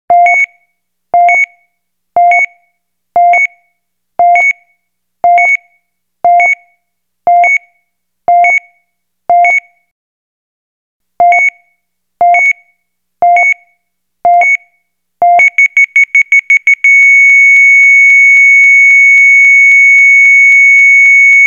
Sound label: EKG Beating EKG Flatline; Halloween